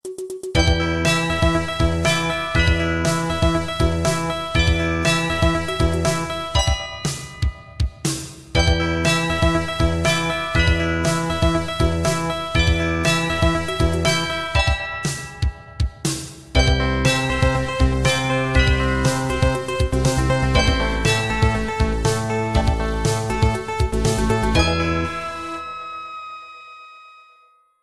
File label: arabsque ringtone